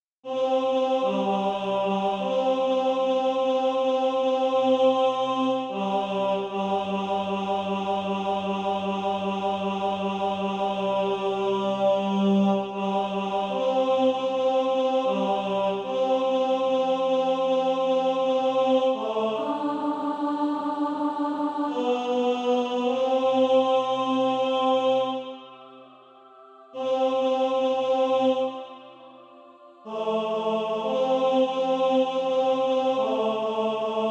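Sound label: tenor; Christ Is Coming; Bilhorn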